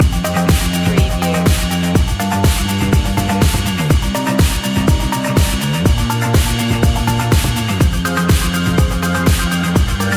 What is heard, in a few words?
streamliner loop